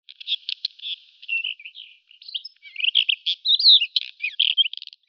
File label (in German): Berbersteinschmaetzer Oenanthe halophila; Vogelstimmen